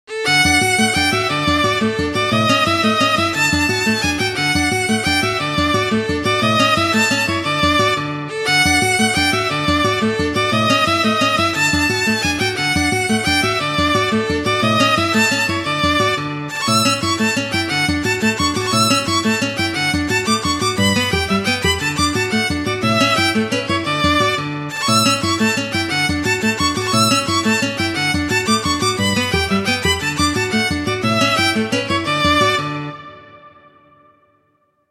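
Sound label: Allegro, Sonata violin guitar; Paganini; Sonatas Op1 Vl Gu; Sonata No 3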